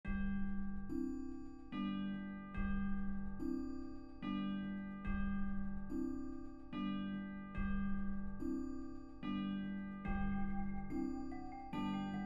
Bolero, Percussion Ensemble: 12 Players, Instrumentation: Bells, Xylophone, 3 Marimbas, Vibraphone, Chimes, Timpani, Snare Drum; By: Maurice Ravel